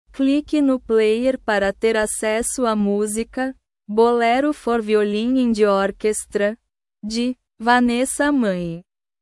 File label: bolero for violin and orchestra